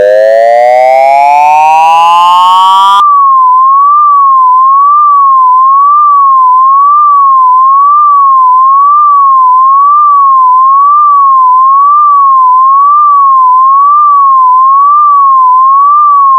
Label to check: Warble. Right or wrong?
Right